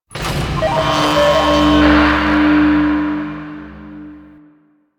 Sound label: Alarm Trigger Start; Scaldra; Warframe; NineteenNinetyNine; Stage3RideOrDie